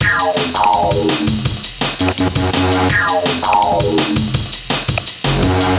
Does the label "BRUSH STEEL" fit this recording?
No